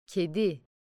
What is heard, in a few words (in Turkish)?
Kedi